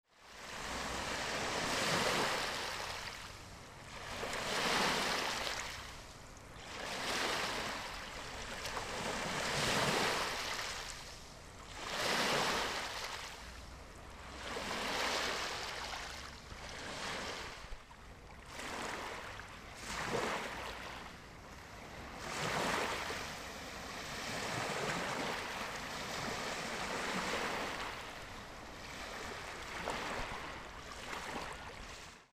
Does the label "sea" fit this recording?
Yes